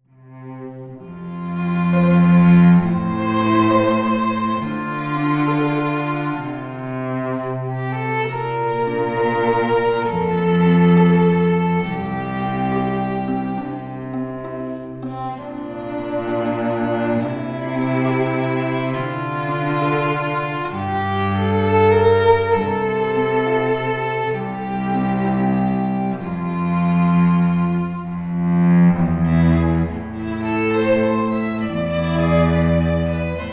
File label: String Trio